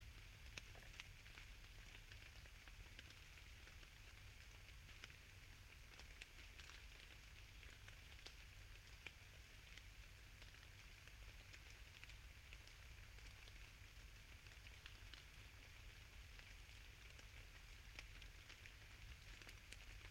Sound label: yamanakako sound